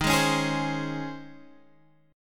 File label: Db Major 7th Suspended 2nd Eb